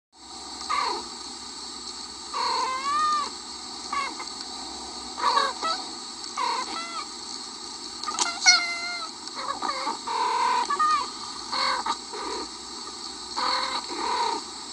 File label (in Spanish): Murmullo, sonidos del gato